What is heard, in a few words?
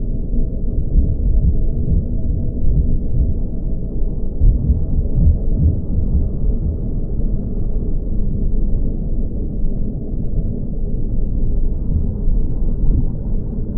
amb system reality breach hum; Stellaris; nemesis